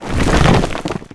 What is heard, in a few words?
Rock Move; Finding Nemo; XBOX